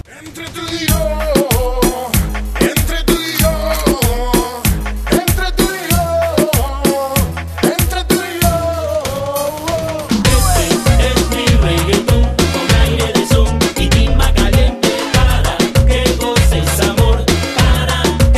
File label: reggaeton